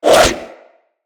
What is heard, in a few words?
Boomerang Throw; Weapons